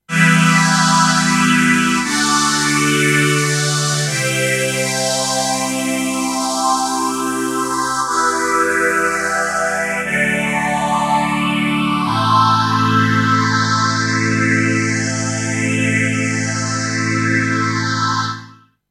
Vintage String Ensemble Moogerfooger 12 Stage Phaser